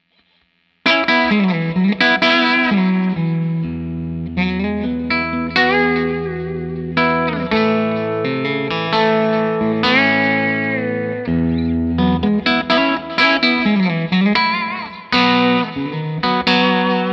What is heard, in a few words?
6v6 verb clean tele